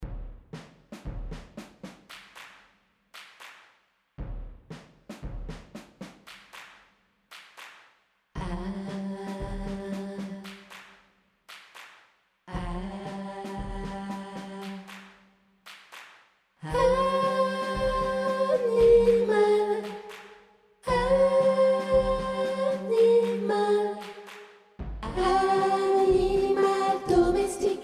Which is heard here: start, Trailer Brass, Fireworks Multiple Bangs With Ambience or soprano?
soprano